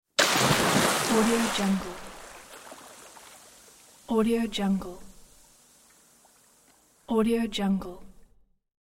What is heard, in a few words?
dive into swimming pool; domestic sounds